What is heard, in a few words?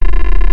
Terminal